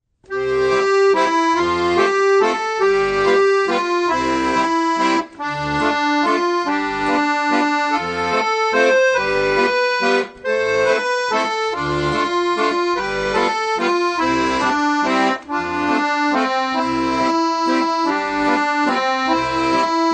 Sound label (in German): Kleiner Walzer, Akkordeon; Der Legatobogen; Akkordeon Workshop Band 1